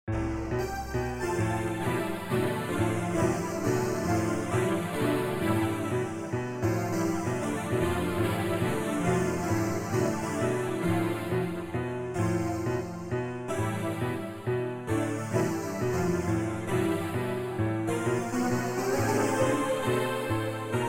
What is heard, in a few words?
Animation Score